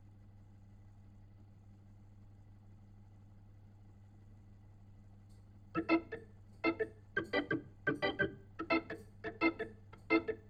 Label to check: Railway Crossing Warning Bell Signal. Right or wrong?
Wrong